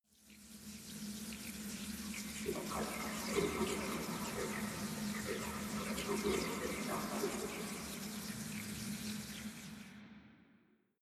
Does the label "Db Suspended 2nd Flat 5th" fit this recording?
No